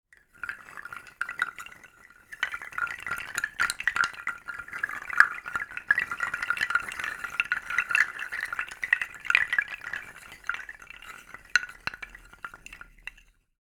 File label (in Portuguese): CACHO CASTANHAS